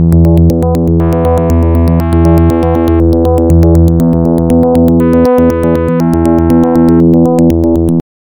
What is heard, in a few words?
SEQ DX7 ALG 10